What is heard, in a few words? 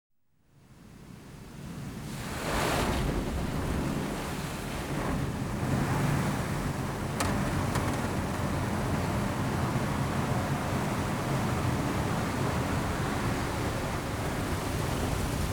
CAR Wash, Inside Renault 5; BBC Sound Effects Library